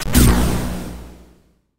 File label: Retry Jingle